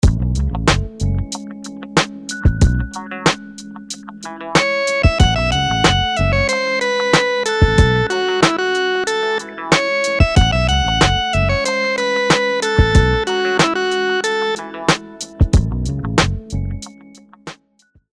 rhythms dotted 8s

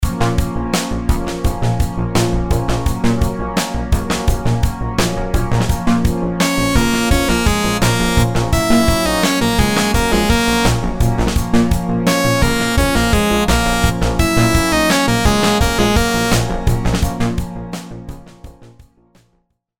rhythms simple dotted 8s 85

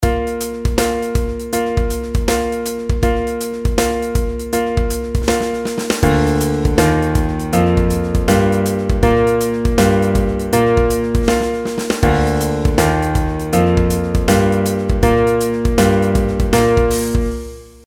pitch patterns skipwise; melody

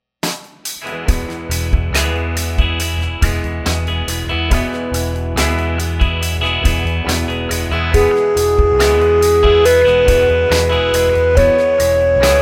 scale degree

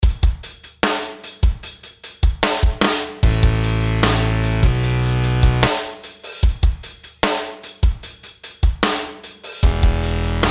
intervals; m2-M2

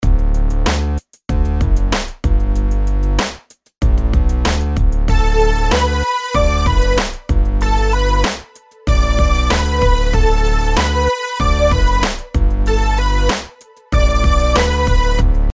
rhythms simple 8s major

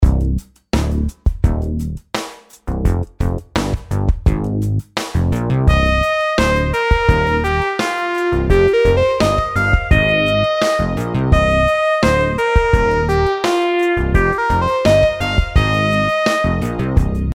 rhythm simple 8s triplets

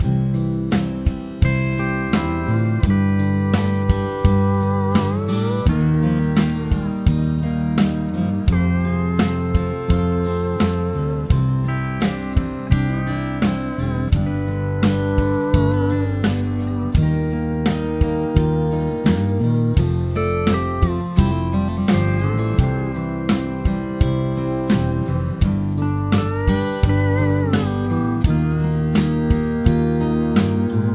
chord prog with mixture

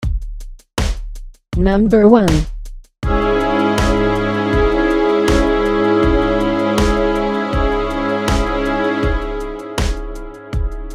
chord quality id

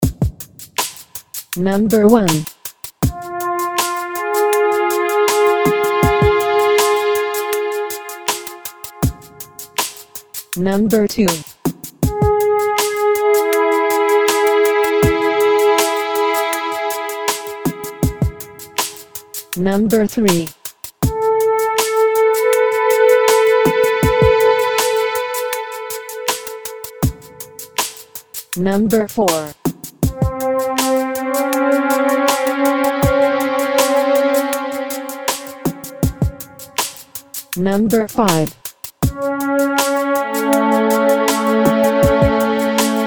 intervals m3 M3

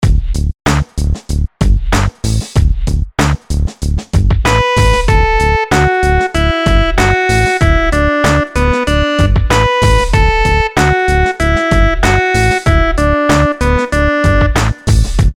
melodies simple syncopated, Dmaj; melody

